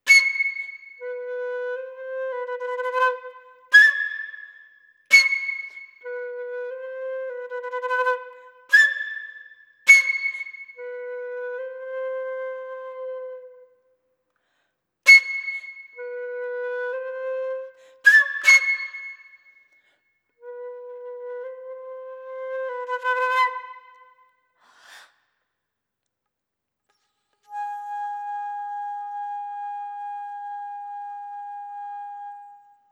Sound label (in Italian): Flauto